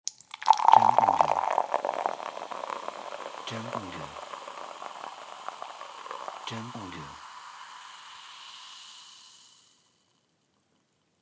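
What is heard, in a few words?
Pouring Coca Cola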